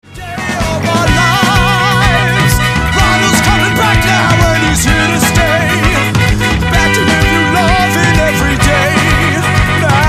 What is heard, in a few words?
rhythm; musicianshotlinemagazine